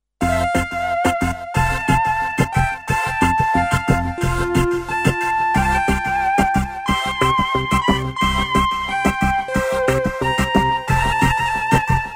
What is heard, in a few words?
sonata a-moll for flute and basso continuo adag; paolo benedetto bellinzani; part3 adagio